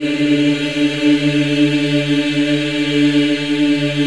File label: D3, Choir; USB Soundscan vol.28; Acoustic & Synth; AKAI